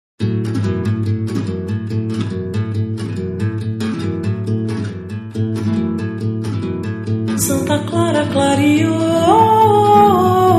cantigas de roda; canc folclor brasil

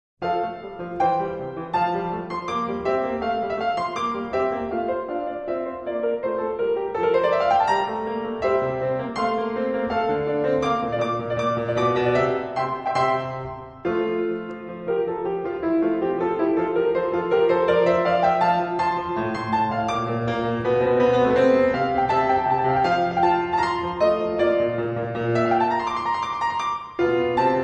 contratenor

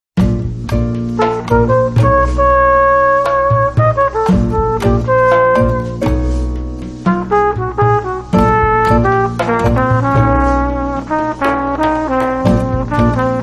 harpa bossa

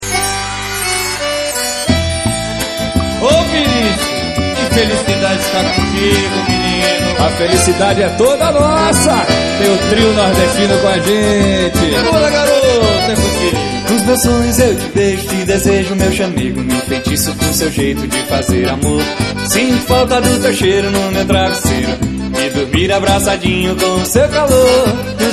xote baião ao vivo

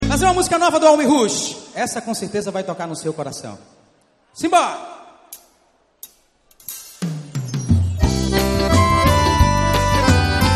forro

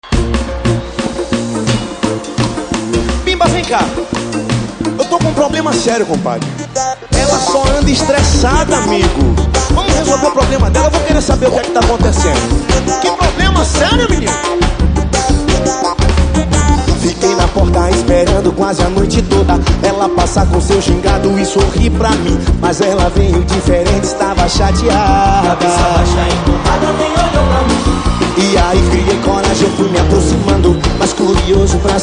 samba ao vivo em Salvador

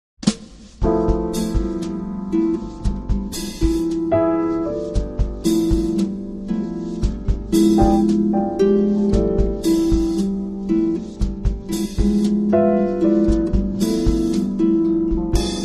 harpa bossa

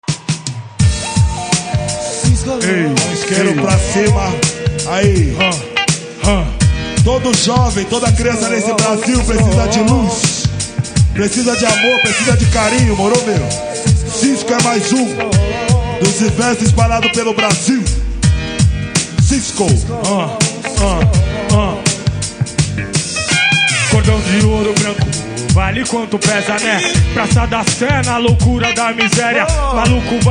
rap ao vivo; sampler rap

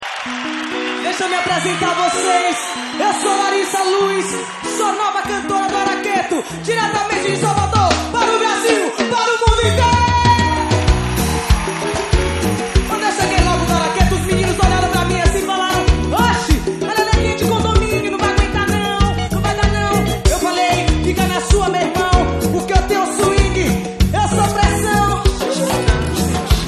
ao vivo em Salvador

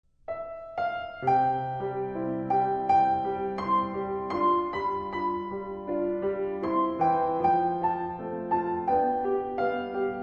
contratenor

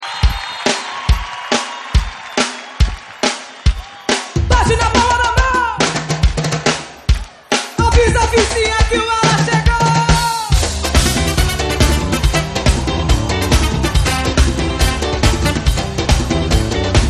ao vivo em Salvador

